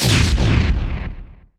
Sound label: static remnant explode; storm spirit